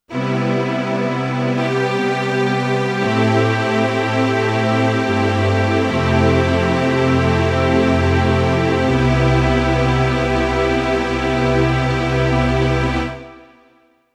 Korg Liano Strings

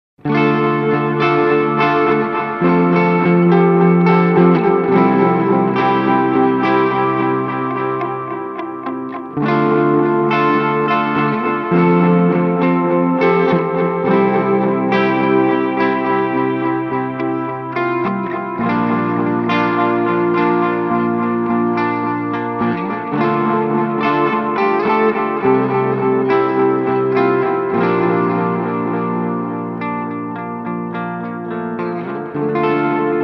Eventide H90 Sound; Weedwacker Head Space

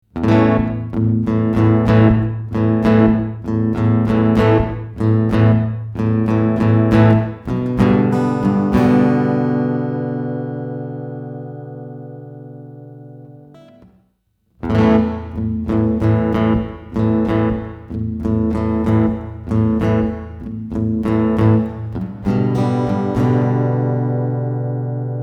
Reverb

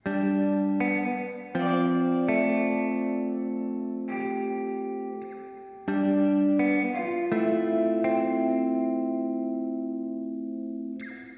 Boss HX Stomp Reverb Hall

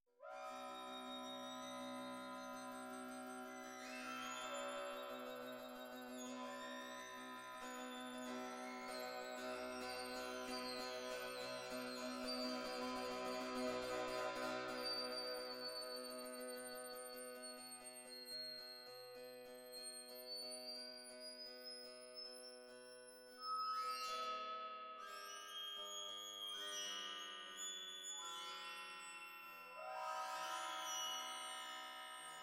OSMOSE waterphonie; EXPRESSIVE E

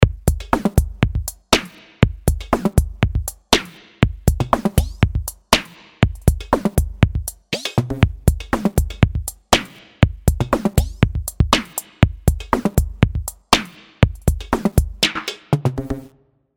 DrumComputer; AR Ladybugz

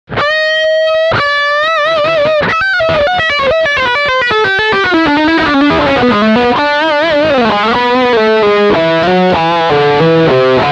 Humbucker Lead Rear

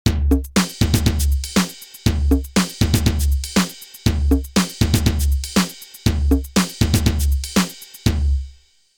Beat

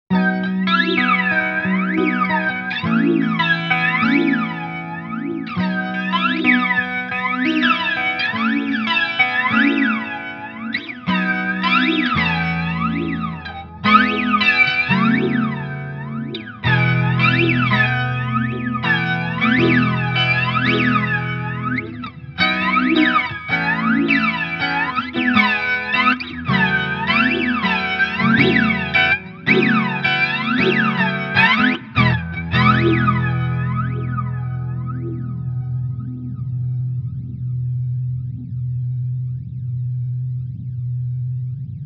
Flanger; Harley Benton MiniStomp Hot Mod

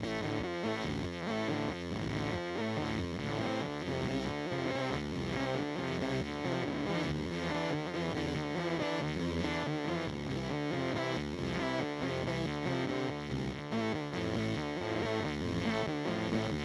Pigtronix Space Rip

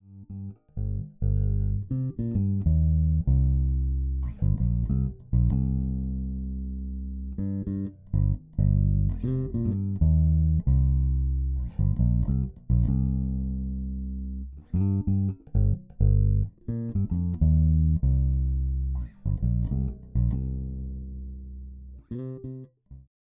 Ibanez aktiv bass boost treble cut; 450

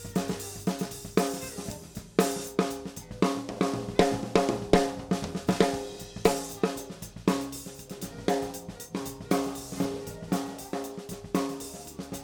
Roland SC Snare Clean

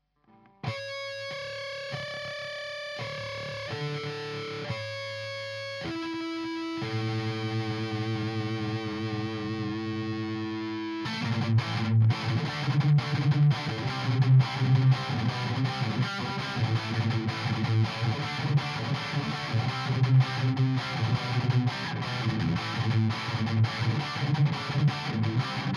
EHX 5150 Rhythm Burn scoop L3 SM57

